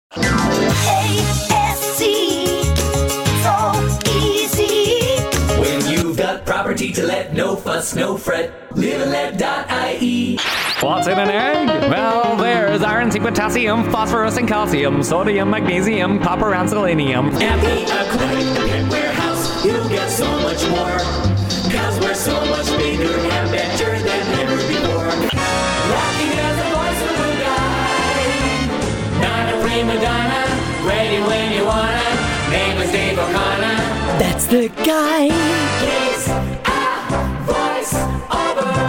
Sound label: Singing